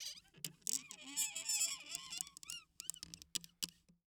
Creaks Wood Hinge; household

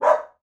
Animal Dog Bark; animals; AdobeCC

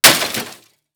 Impact Hammer Hit Broken Television; Impacts

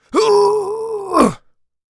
Human Male Groan Constipation

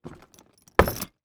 Household Luggage Set Down On Cement Ground

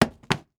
Household Book Hard Cover Dropping On Cement

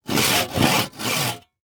Industry Sawing Wood Short

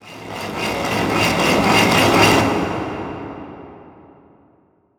Transition Reverse Warble Reverse; Production Element; Title Transition; AdobeCC

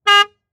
Auto Saturn Honk Horn Short; Transportation

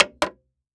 Foley Footstep High Heels Jump On Wood Boardwalk; Foley Footsteps

